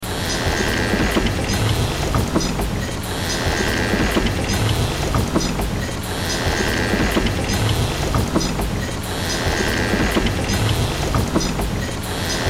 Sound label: Sci Fi Workshop Machines Mechanical Steampunk; Tech; Sci Fi Workshop Machines Mechanical Steampunk Wood Large Movement Inventor Metal Clanks Science Fiction; Blastwave